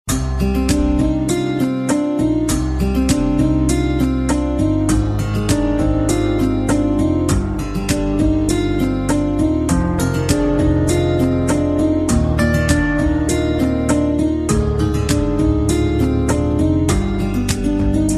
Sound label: melodious flute ringtone